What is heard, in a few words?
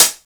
HH, Drum & Bass, 135 BPM; Ueberschall; Drum & Bass Flash Trackz